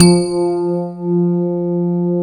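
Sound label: grand piano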